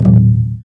drum; The Elder Scrolls III - Morrowind; Morrowind GOTY GOG

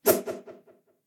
slashes; SyrinScape; Temple of Urgathoa

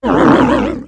moan; The Elder Scrolls III - Morrowind

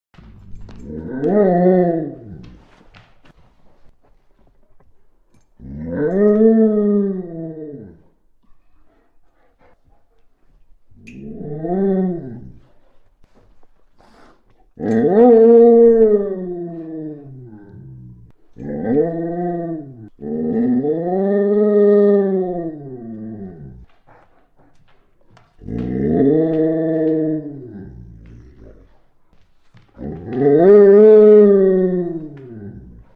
Weird Dog Beast; SyrinScape; Library Depths

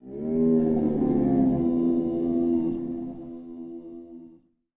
howl; The Elder Scrolls III - Morrowind

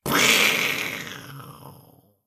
splosions

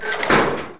Spikes; Sotha; The Elder Scrolls III - Morrowind; Morrowind GOTY GOG

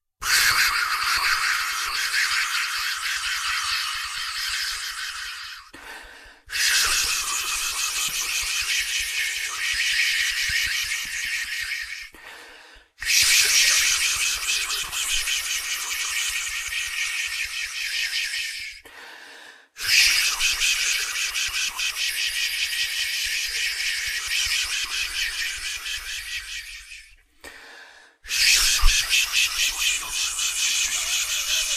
rain; SyrinScape; Syrinscape unplugged; Jungleplanet dinolaser battle

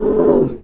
roar; The Elder Scrolls III - Morrowind

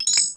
Conjuration Cast, magic; The Elder Scrolls III - Morrowind

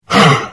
Hit; The Elder Scrolls III - Morrowind

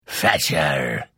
Hit, Vo

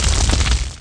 hit, magic; The Elder Scrolls III - Morrowind; Morrowind GOTY GOG

